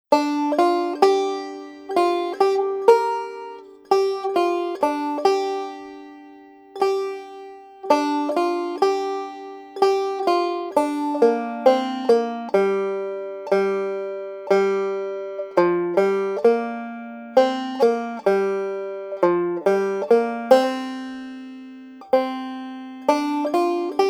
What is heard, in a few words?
Melody; Darlin Cory